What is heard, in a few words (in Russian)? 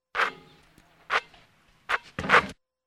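серия звуков попадания мяча в сетку 4 варианта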